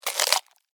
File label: Insect Eat Chew, Bite, Crunch, Bug